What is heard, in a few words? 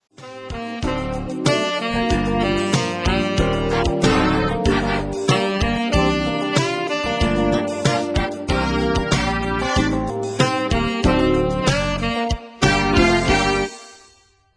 samba funk